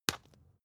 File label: spice jar smack open slow; industrie wirtschaft; gastronomie